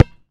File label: Street Ball Bounce Dirt, Street Basketball; SFX Kit